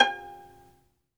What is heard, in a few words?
SOLO VI, Synclavier Strings; ILIO